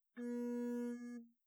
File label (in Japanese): ヴーン, 効果音